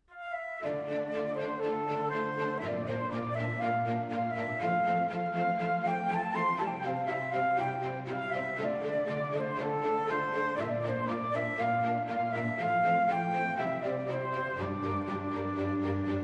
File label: Instrumental